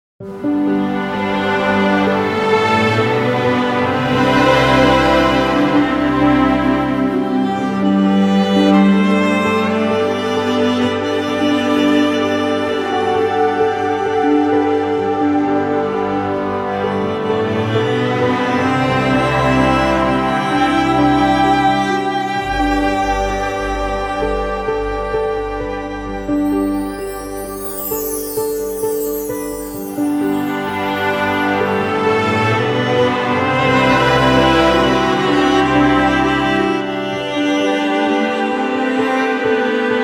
ORCHESTRAL Ice Dreamland

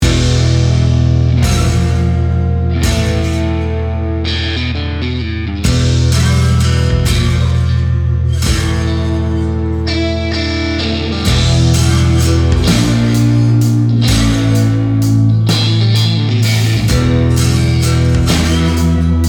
Retro Rock

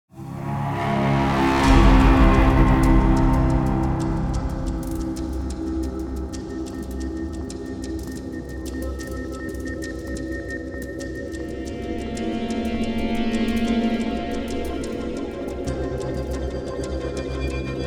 Morse Code Tension thriller

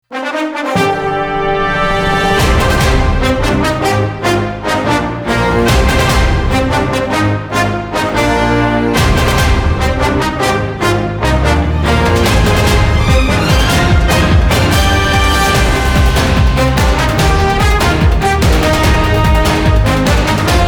TV Theme Orchestral Hybrid; FOX News

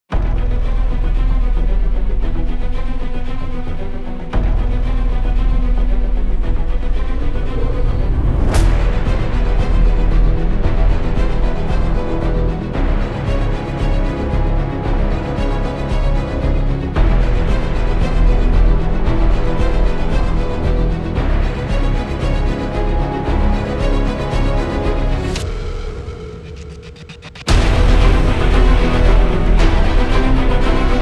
action strings

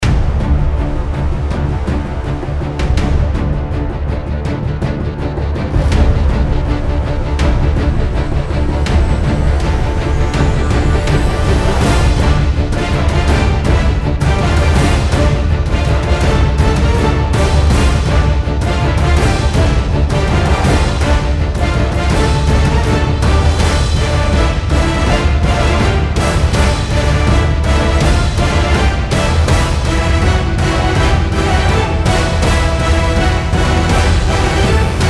Trailer Orchestral

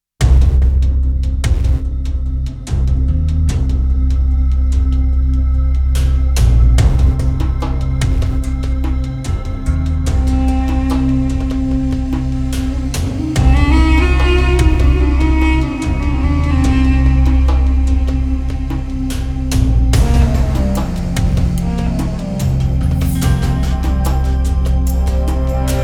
Hybrid Ethnic Orchestral

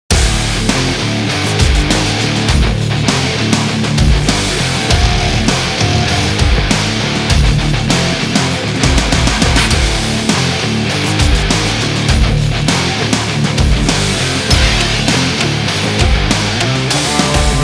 Ingame Heavy Guitar Rock LIVE

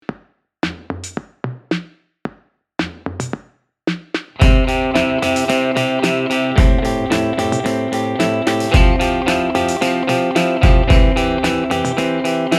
Score Indi Pop; Dr Schmidt

